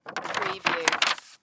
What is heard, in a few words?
Calendar Page Up; sound effects bible